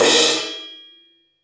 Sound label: crash choke, Drums Percussion; Drum Bass; Zero-G; Total Drum Bass